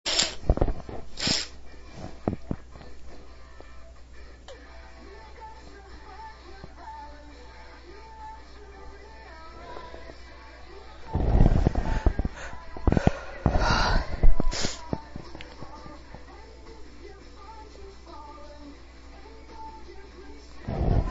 build ups stifles false starts failed stifle, female